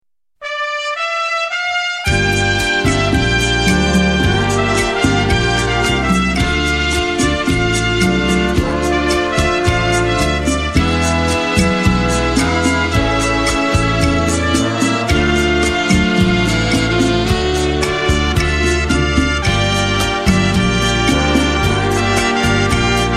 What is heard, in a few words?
trumpet cuba